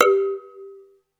PERC G3; SEQ AND PERC; Music Store - Claudius Diemeter Schwerlos

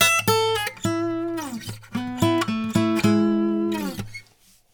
108 HI RUM LICK, Blues Guitar; ILIO; Fretworks

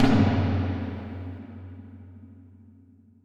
FX TOM, Drums Acoustic; FX KIT; USB Soundscan vol.10; AKAI; 90s sample CD

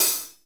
HAT SLOSH; 90s sample CD

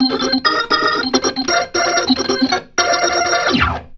RIFF, ORGAN RIFFS; InVision Interactive

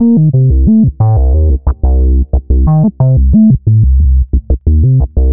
Synth Bass

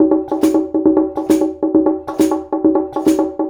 Conga and Hi Hat, Essential Percussion; Sonic Foundry

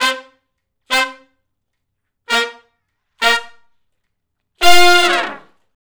R&B Riff V. IV, I (F) unison, Horncraft for R&B; Crimson Blue and Fabulous Horncraft 4 RnB; Sections

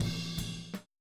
Boogaloo Chorus Tom Rack, 82 BPM

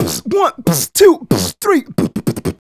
Beat Box, Hip-Hop and RnB Vocals; Sonic Foundry

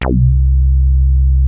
WEST SOFT C2, ANALOG BASS; Music Store - Claudius Diemeter Schwerlos

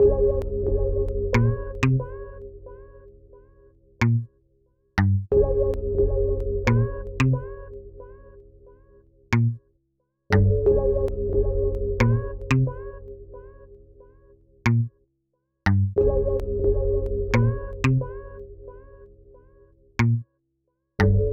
Melody; Sonic Foundry; Westside Underground

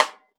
RAW RIM, Drums Acoustic; AKAI; 90s sample CD; USB Soundscan vol.10; RAW KIT 3

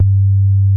MOOG LOW G2, ANALOG BASS; Music Store - Claudius Diemeter Schwerlos

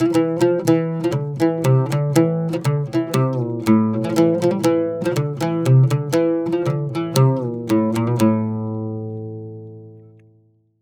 120 oud

